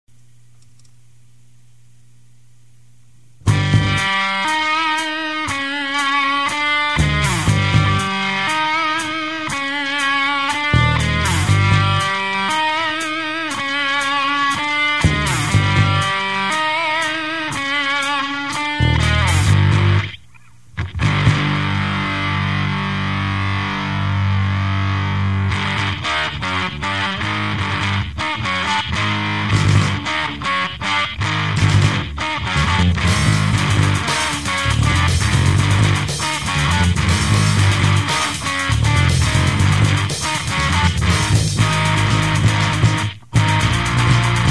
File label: eerie light